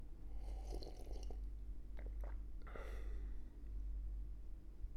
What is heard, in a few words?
Coffee Sip